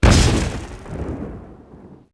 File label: fireworks pop; Max Payne; ragnarock